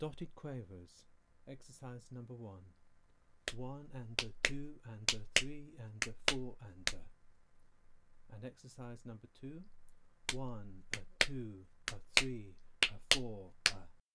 Dotted Quaver Exercises, guitar

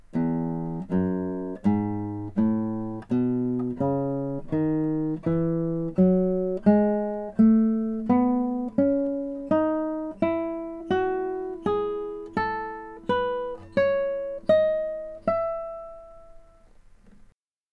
E Major Scale

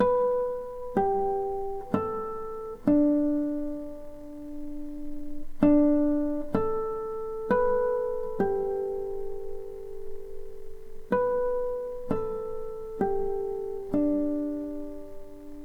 Big Ben Chimes

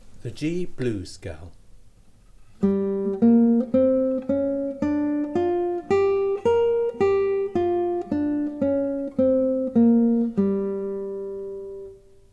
G Blues Scale

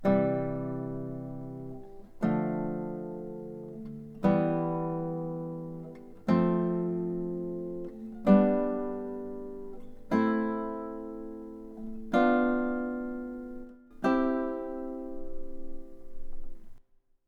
Harmonised Scale

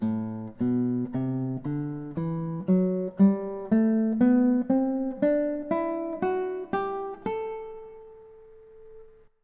Dorian Mode

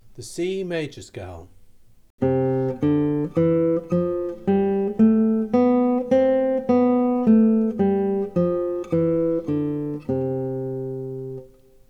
C Major Scale Low, guitar

